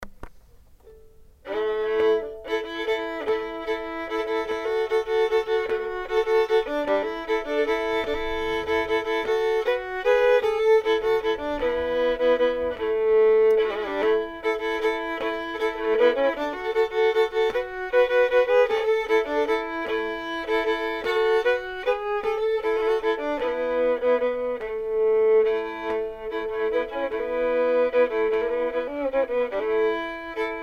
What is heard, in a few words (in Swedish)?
Stämma Pelikvalsen